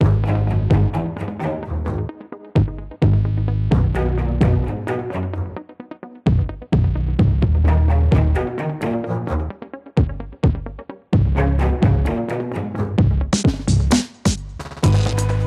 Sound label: instrumental